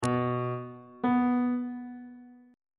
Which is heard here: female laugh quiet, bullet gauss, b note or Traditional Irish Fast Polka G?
b note